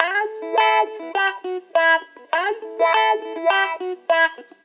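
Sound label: guitar, Roots Funk; USB Soundscan vol.41; AKAI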